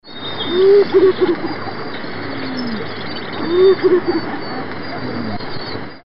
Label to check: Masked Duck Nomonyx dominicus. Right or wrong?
Right